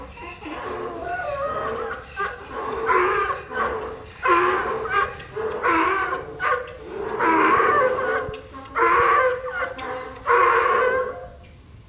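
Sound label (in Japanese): roba, ロバ 鳴き声; 上野動物園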